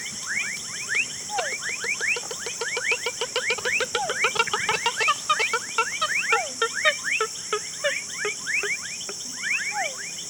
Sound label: porphyrio martinicus